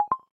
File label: Cable Connect